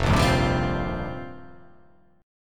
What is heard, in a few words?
F 9th Suspended 4th F, chord; strummed; JGuitar